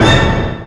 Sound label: Orch Hit, Korg M1